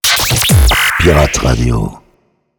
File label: sweeper intense